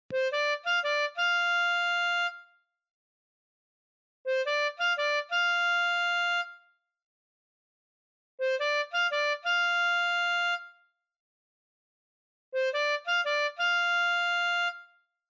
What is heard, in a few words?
No Sweat Blues Harmo Swing, harmonica